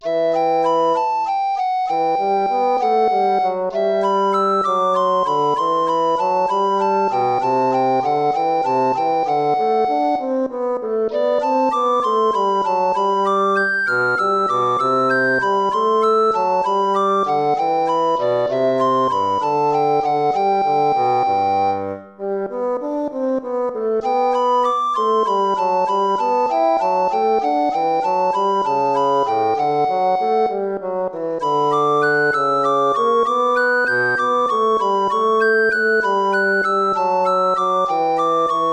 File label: gigue